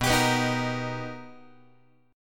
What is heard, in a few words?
B Augmented Major 7th B Guitar, chord; B+M7; strummed; x,7,10,9,7,9; JGuitar